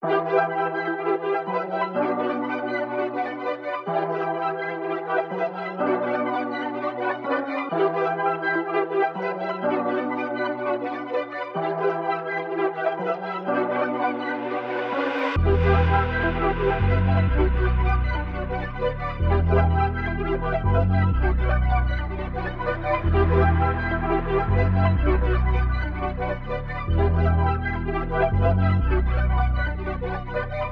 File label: 125 BPM B Min, Melody; Cymatics; 2022 Melody Collection Platinum Expansion